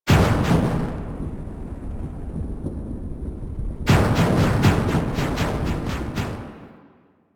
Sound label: Explosion Transition; Warframe; Caliber Chicks minigame; environment